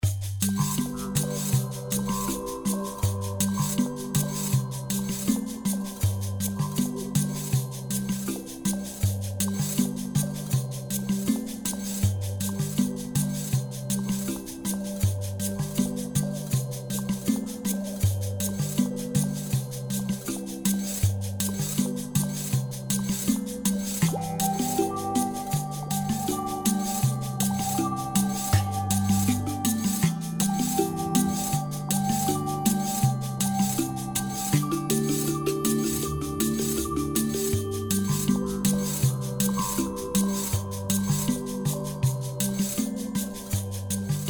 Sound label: Background Song